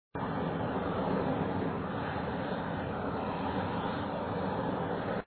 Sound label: Booster Loop; papas hot doggeria; android; x68000